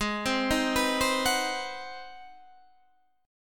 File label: G sharp 7th Sharp 9th G sharp, down, Guitar, Standard; G#7#9; arpeggiated; JGuitar